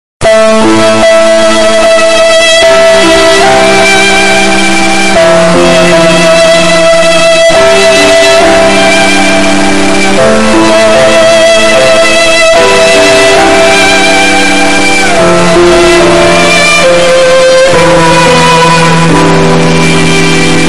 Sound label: sad violin ear rape